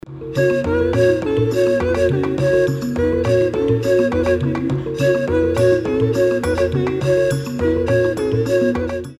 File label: flute peule